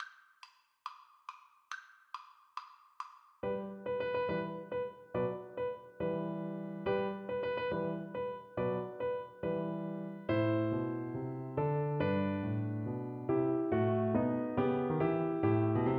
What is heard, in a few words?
French Horn